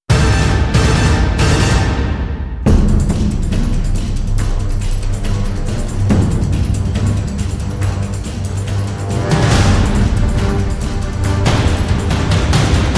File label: Percussion